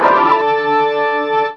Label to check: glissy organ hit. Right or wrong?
Right